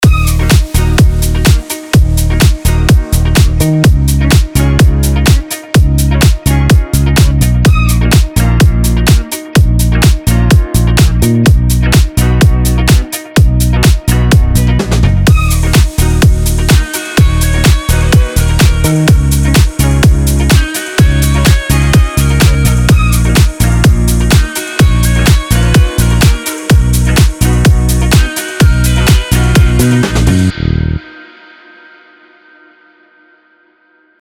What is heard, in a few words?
French House